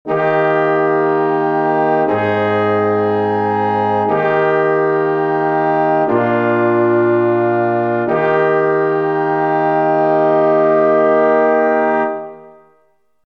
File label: cadence, intonation